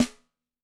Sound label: Brass Pic, Yamaha DTXpress-II snare